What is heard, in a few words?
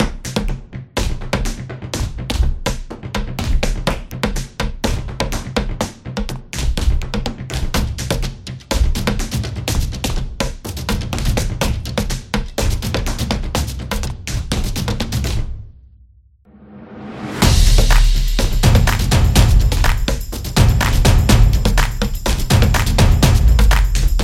Kid Sport